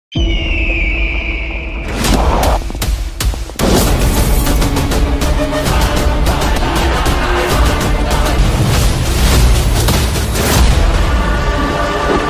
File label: trailer bgm ringtone